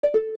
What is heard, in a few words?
Mini Map Off; Toggling the Mini-Map ('Off' is also used to toggle first-person view)